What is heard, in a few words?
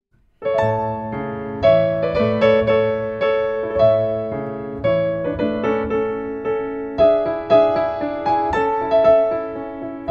Menuet; JCF Bach